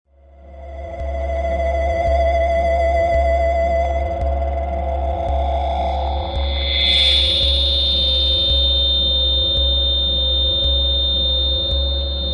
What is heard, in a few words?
056 feedback of the mind